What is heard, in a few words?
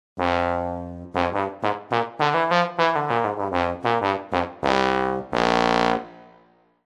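bass trombone; TURBO VL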